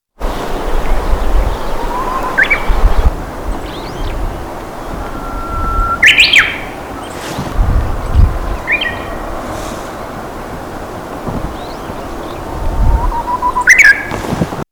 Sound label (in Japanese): uguisu